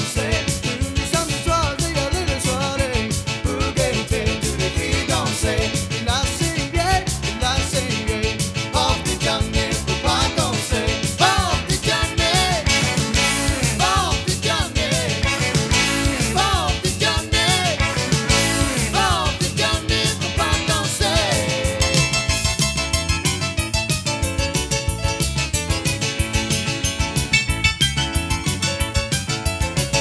country

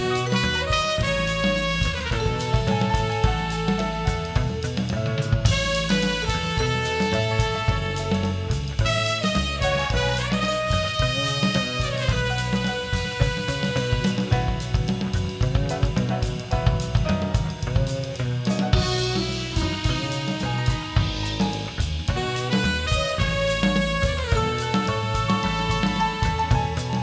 jazz

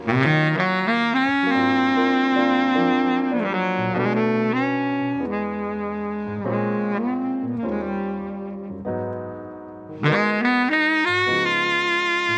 jazz

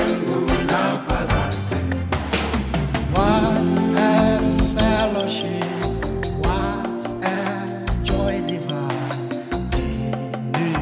pop

